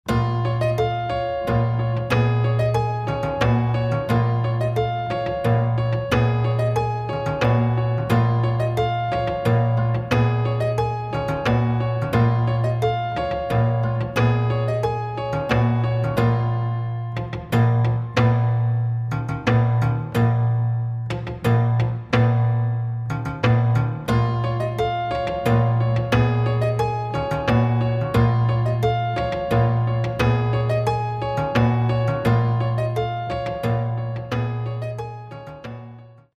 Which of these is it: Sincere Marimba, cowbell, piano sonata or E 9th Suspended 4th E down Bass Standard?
piano sonata